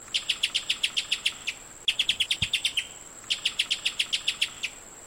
suara pijantung besar

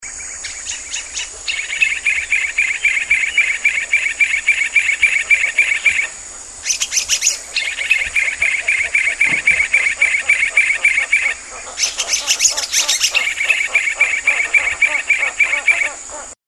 suara brinji emas utara

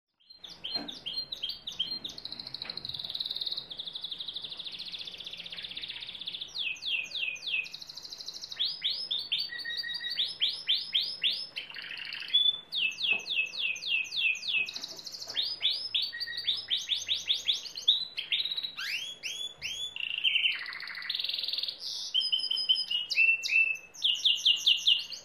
kenari lizard

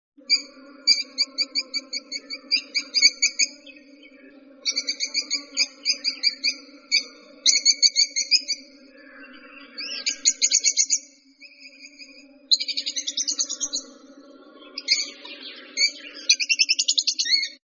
suara call lovebird

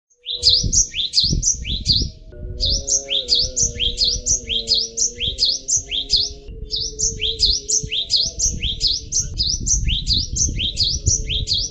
variasi gelatik; wingko

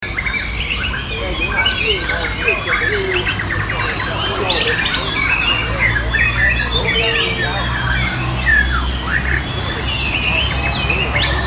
audio simulasi lomba murai batu full gantangan

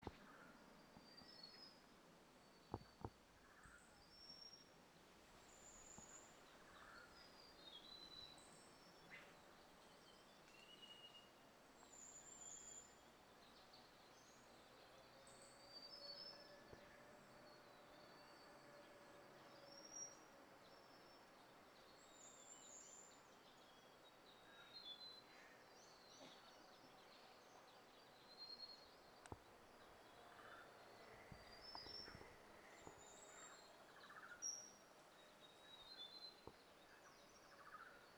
suara burung cissa thalassina ekek keling

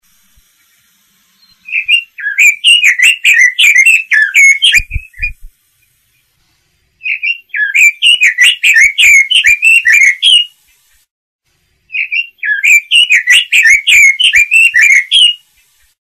robin jantan

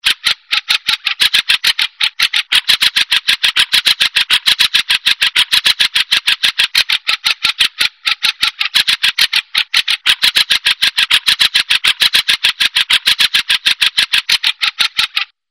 cucakjenggot

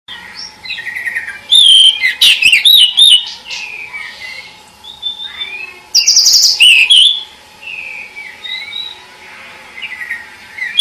suara burung decu sudah jadi

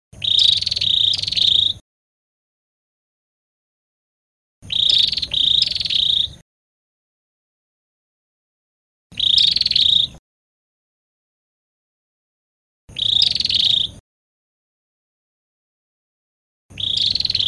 suara burung perenjak kamboja

